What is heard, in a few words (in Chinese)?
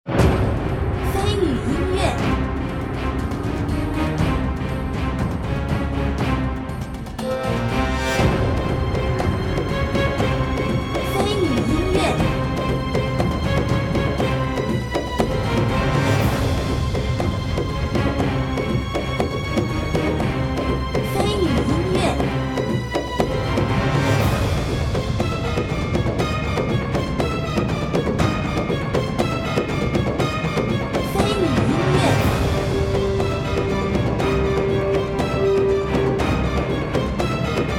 战斗 激烈 危险 紧张